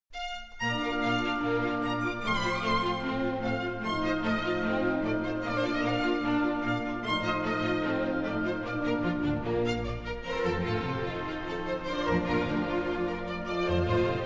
Concertos for Piano; Sondeckis; Mozart; Koncertas fortepijonui

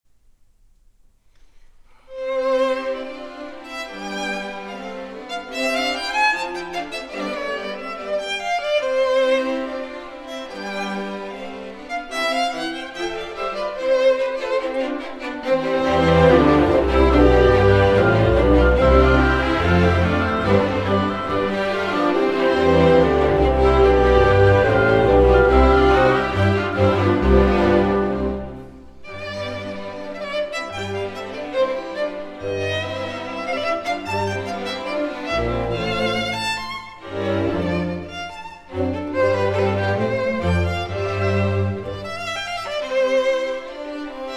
Rondo in C for Violin and Orchestra; Henkel

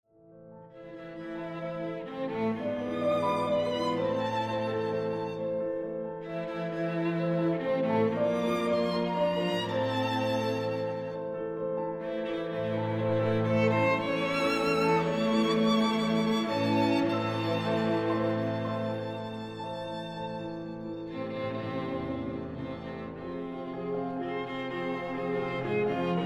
Quintet for Piano and Strings in F minor; Franck

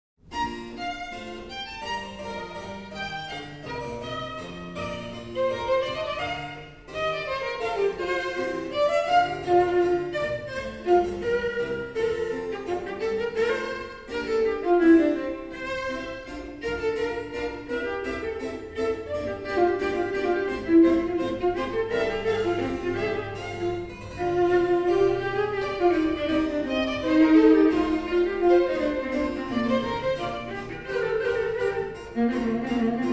Trio; Locatelli; Nr. 2 B-dur

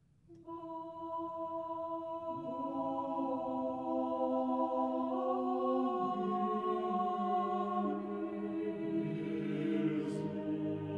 Three Liturgical Choirs; Urbaitis

